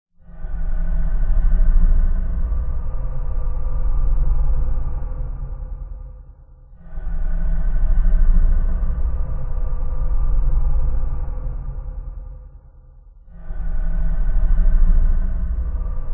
sounds

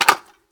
g43 mag out; weapons; sven; cryoffear

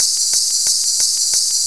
med charge

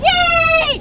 yay

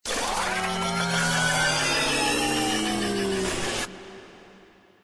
powerup sfx

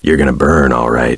taunt; jc denton

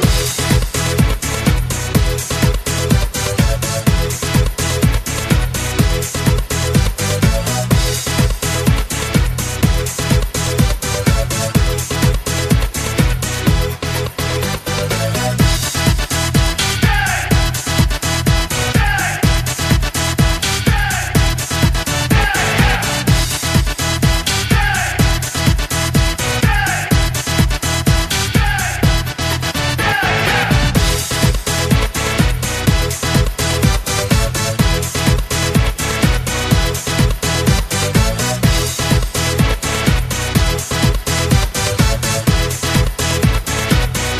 jam loop

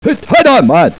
dynamite

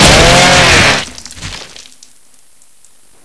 chainsaw hit bod, weapons

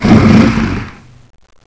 push box

